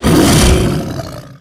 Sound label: death black dragon, creep deaths; dota